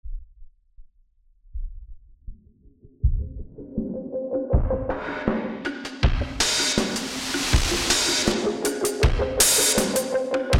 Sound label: Chill Beat